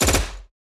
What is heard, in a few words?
Assault Rifle; SoundsCrate